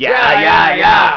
yayaya

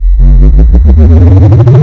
cloak up

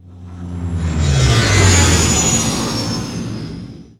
flyby falcon

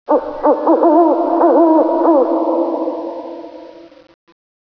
owl

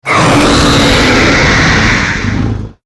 dragon roar

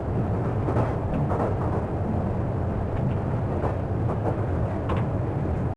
subway

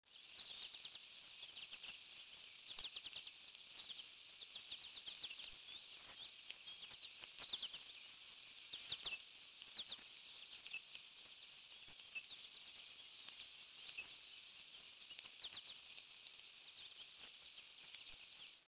swamp ambient

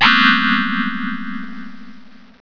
scare

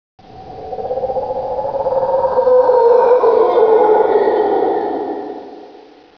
cave

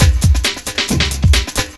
Jungle Bop

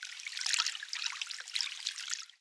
gas pour

